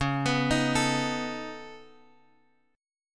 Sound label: Db 7th Suspended 2nd Sharp 5th Db down Guitar Drop D